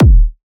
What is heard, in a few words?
Techno, Kick; One Shots; GhostHack; Ghosthack - Free Techno Sounds 2024